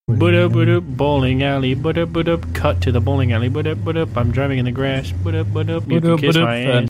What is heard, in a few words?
bowling alley; wildcat